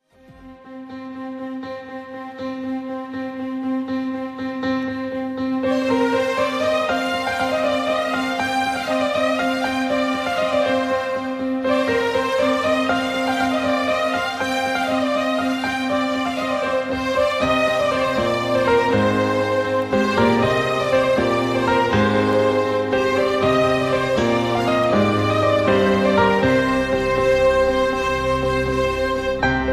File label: love bgm; siva manasula shakthi